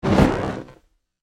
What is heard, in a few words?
Surge; ERIS